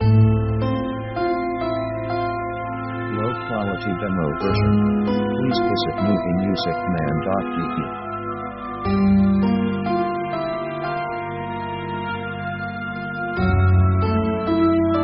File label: orc melancholic modulation; Basically slow